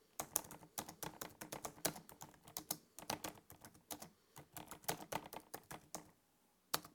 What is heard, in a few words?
Keyboard Typing